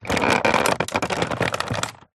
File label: foley plastic creaks stress pressure toy car